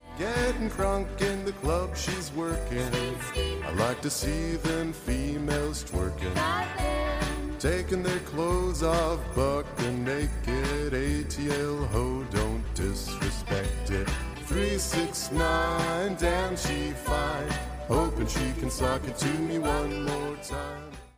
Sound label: christmas ringtone